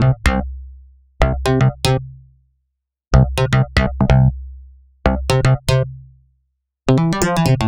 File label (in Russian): басовый сэмпл диско хаус